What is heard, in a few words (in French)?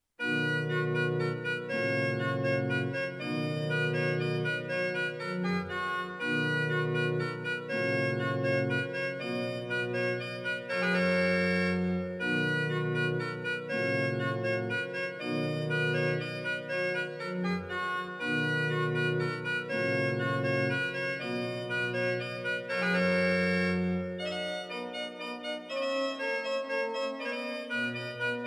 orgue; Reducciones